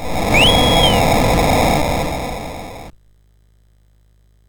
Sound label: sid, MOS6581R4; Classic Concentration